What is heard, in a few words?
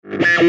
nursequacky mod dist